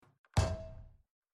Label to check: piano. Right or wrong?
Wrong